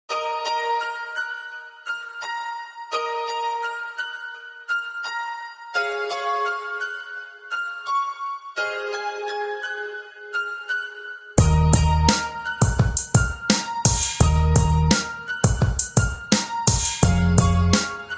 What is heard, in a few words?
hip hop piano ringtone